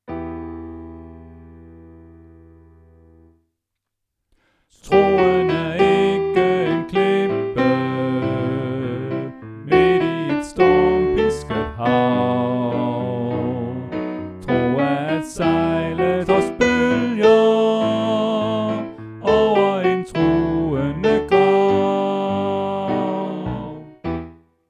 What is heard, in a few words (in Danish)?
Sopran; oevefiler